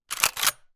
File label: gun nova; still north media